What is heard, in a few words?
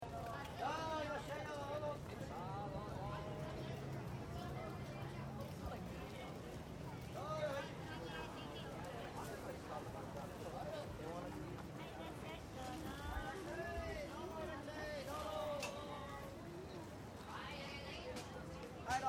city ameyoko, ambience